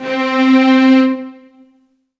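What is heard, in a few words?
Alesis S4 Plus Trem Strings C4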